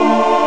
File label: mega string; Amiga Soundtracker Sample Packs